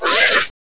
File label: small flinch